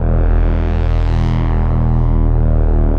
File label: pensive, Synths